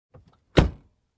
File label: Closing Car Door